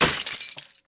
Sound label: Icebreak